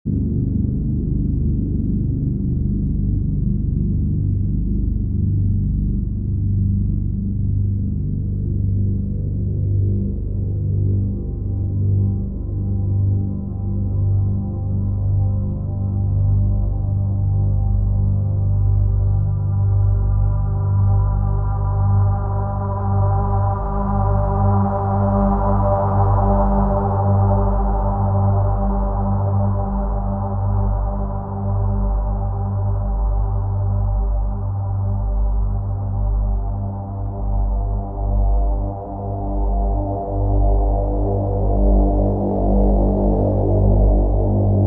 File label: Signal to Noise